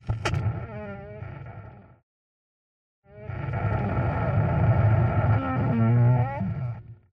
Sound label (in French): VIEILLE PORTE